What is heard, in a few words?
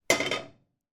household wok put down on stove